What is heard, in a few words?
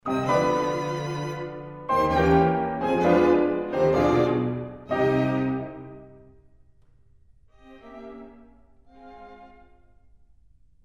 piano qnt; kiel